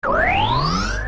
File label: Mirror Shield Absorb Start; Zelda; OOT